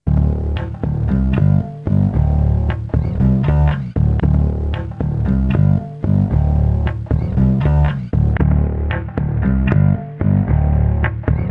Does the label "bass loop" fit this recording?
Yes